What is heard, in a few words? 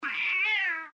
sad meow